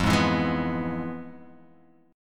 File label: F Minor Major 7th Flat 5th, F, Guitar, Open G; 3,1,2,1,0,x